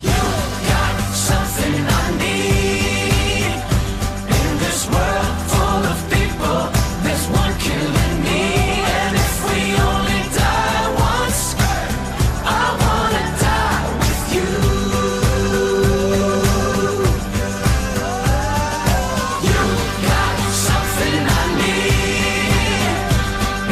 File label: Ringtone